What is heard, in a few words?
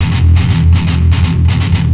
Riff, Industrial; Nasty